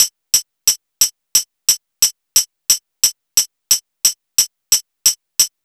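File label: MPC hi hat, drum kit; USB Soundscan vol.03 - Pure Electro; AKAI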